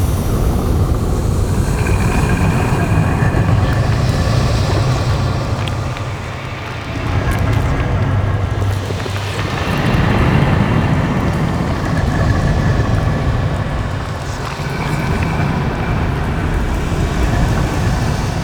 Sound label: Moon Interior Amb; Warframe; Ambience; Orokin Moon